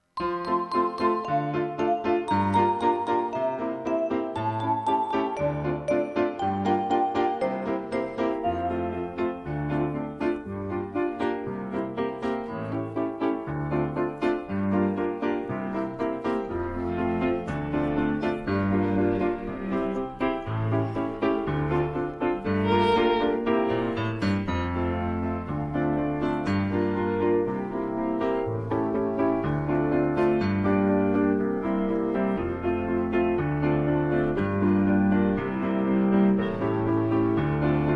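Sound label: backing track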